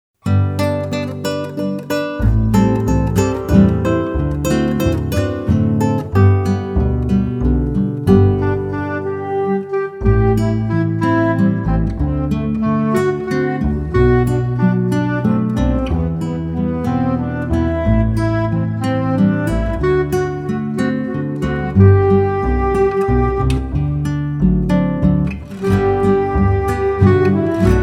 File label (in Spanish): Salmo 145 Pista con melodia instrumental